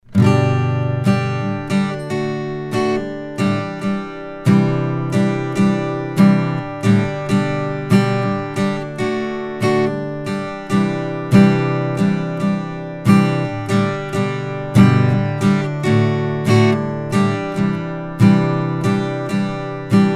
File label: Acoustic Guitar; Royer R-122; Ribbon